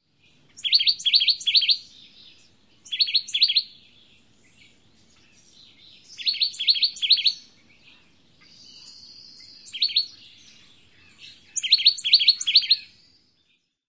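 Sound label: song, bird; CA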